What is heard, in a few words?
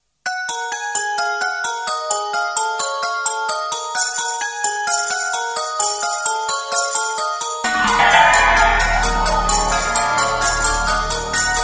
new love tone, ringtones